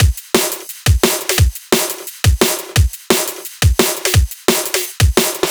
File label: Drums, 174; O Mode